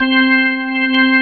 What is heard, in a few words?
ORG, Organs